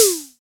acoustic tom single hit f sharp key, Royality free tom drum tuned to the F# note.; tom drum samples